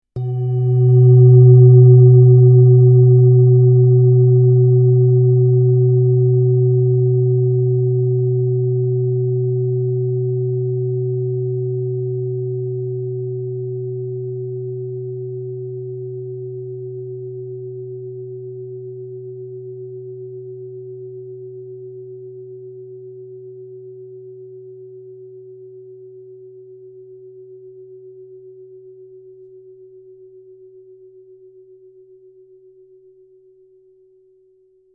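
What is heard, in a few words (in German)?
klangschale sonne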